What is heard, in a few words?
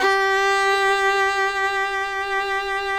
FIDDLE NOTES, G4, L